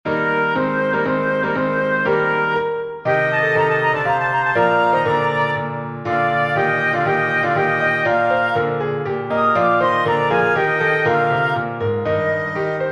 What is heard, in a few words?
for flute oboe piano; haendel georg friedrich; aria lombra del genitore from giulio cesare